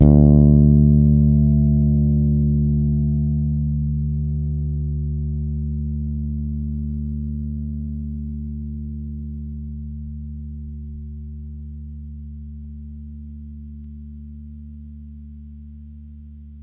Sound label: Ds D3 hard, Bass, Pedulla Thunderbass; Giga Samples Collection